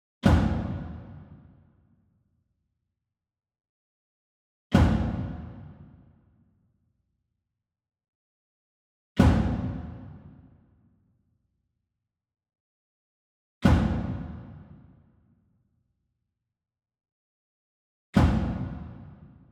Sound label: Small Drum Kick Drum Hard Hit Far; boom library; Cinematic Strikes Construction Kit